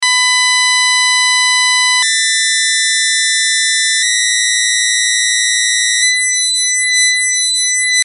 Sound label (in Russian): ton poluton tsent